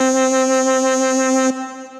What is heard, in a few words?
Synth; Bigzo Synth; Ableton; Retro Synths; Vector; Vector Patches